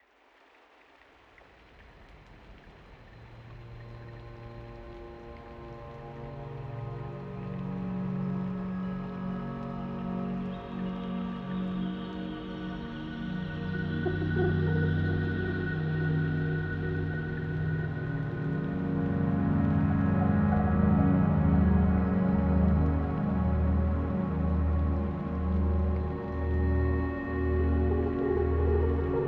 White Noise Relaxation Study Power Yoga Music, Unwind Underwater